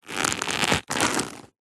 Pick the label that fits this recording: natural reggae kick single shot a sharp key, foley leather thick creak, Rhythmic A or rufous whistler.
foley leather thick creak